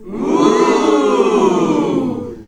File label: OOOOHHH; ClapsOohsAahs; SFX